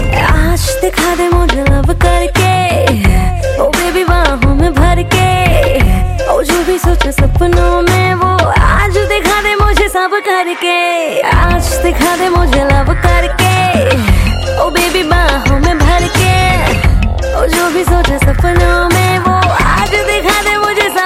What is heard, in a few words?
Romantic Ringtone